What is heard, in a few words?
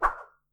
Sword Swing Strong